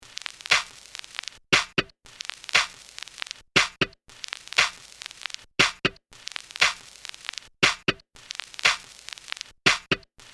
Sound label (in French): salissure de vynil et snare